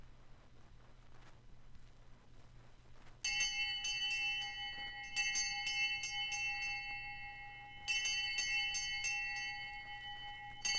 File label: Hand Bell; Electa Quinney